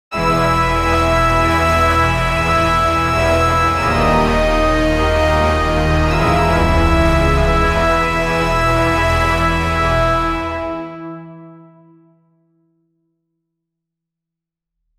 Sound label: ultra, epic